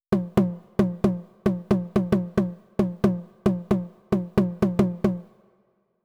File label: 180, 909, MED, HI TOM; MACKIE